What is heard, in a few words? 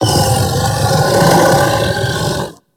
Kubrow Tame Sleep Idle Yawn H; Warframe